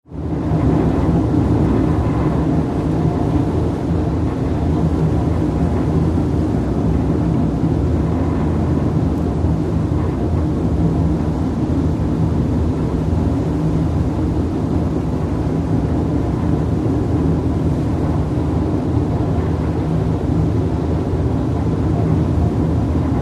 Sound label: BOATS & SHIPS STATEN ISLAND FERRY: EXT: Engine rumble from deck.